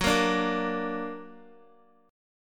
F sharp Augmented F sharp Guitar; x,9,8,7,10,x; strummed

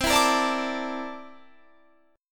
C 13th C down Guitar Standard; strummed; capo=2

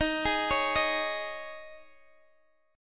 Eb 7th Suspended 4th, Guitar, Drop D

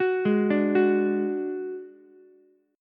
F sharp Suspended 2nd Sharp 5th, Ukulele; arpeggiated; JGuitar

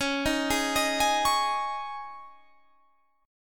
C sharp Suspended 2nd, C sharp, Guitar, Standard; C#sus2; arpeggiated; JGuitar

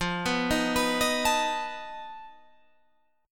F 7th Flat 5th F down

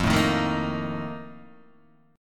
F 11th, Guitar, DADGAD

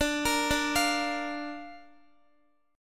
D Minor Sharp 5th, D, down, Guitar; arpeggiated; 4F,4A,5C,5G,5C,6E; Dm#5

